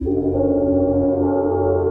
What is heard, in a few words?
Haunting; Inspired by Nature by Dillon Bastan; Emit Presets